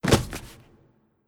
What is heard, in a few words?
Slave Fall